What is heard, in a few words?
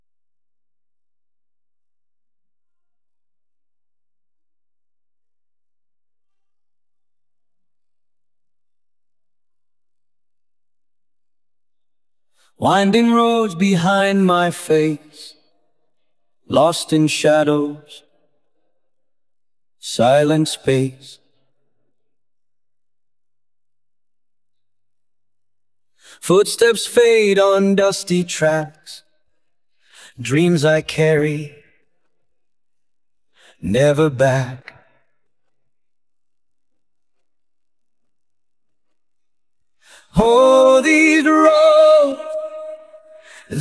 vocal stem